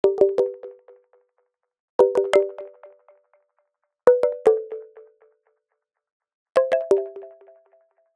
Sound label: Arcade Click Neutral; boom library; The Interface